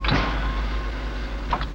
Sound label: door